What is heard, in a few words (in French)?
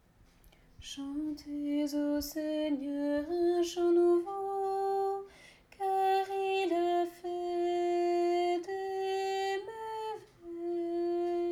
Psaume alto; psaume 97